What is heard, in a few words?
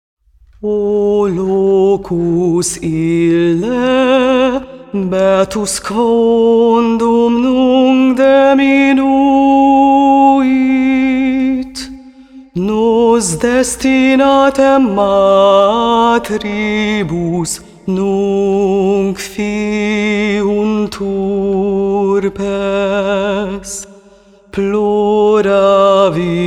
Song of Lament; Elden Ring; PS4; Xbox Series X/S